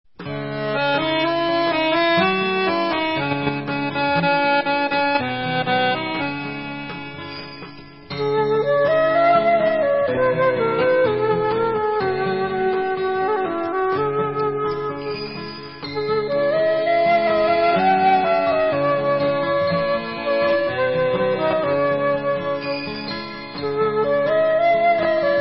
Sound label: Instrumental